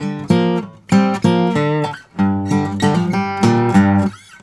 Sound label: Blues Guitar Samples